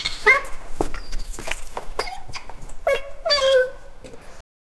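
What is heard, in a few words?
newborn kitten crying loud; alert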